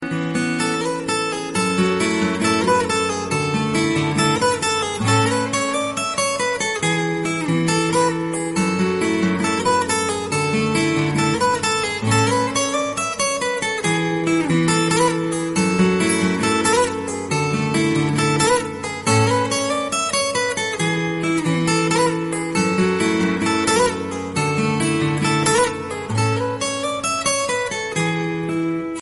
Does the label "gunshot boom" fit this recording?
No